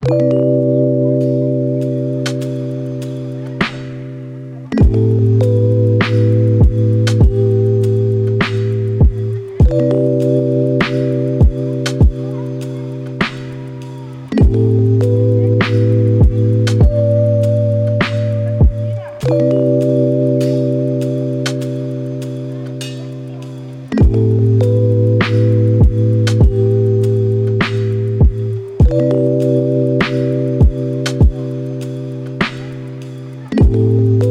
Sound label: lofi hip hop